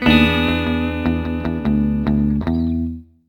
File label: Guitar; Lilo & Stitch Hawaiian Adventure